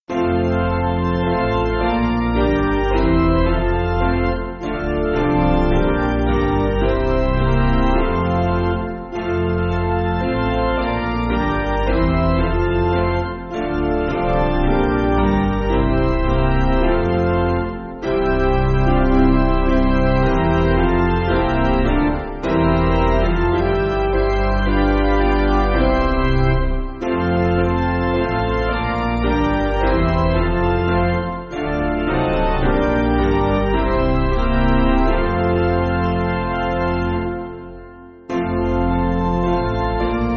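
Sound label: Organ Piano; In Your Temple; Lammets Folk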